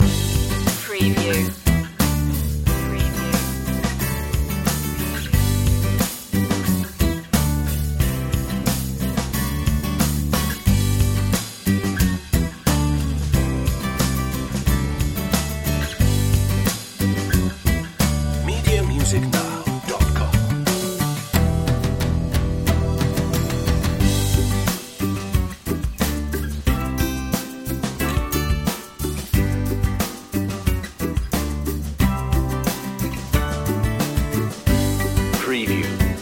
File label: happy journeys loop